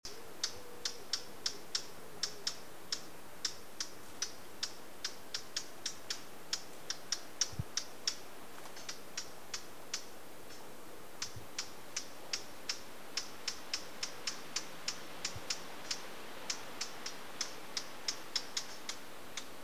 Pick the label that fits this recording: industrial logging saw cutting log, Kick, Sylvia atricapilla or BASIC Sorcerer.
Sylvia atricapilla